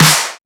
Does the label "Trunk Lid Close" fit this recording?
No